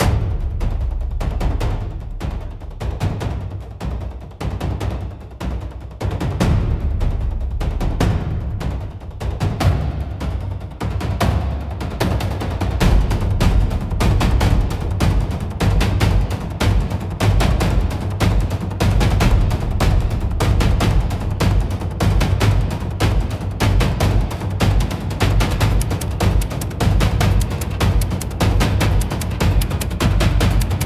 Thunder over Edo, cinematic Japanese style battles